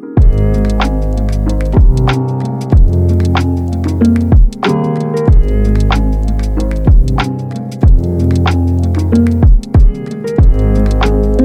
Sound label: Samsung Galaxy Tab A7 Lite Samsung Ringtones